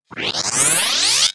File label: FX Start Up Sweep